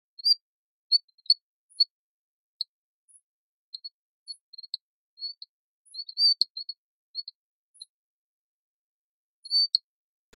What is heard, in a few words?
Gracixalus quangi